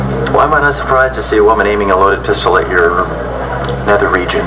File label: pistol